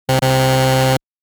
fuseikai

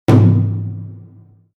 taiko don

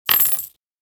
cyarin on

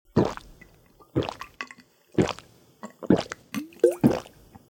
mizu nomi

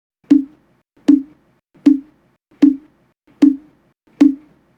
mokugyo

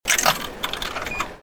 jihanki

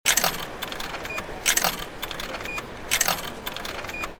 jihanki

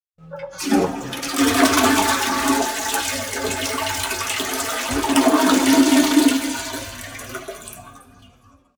toilet on

